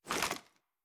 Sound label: ar ads up; viper